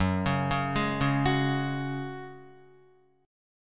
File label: F sharp Suspended 2nd, Guitar; arpeggiated; JGuitar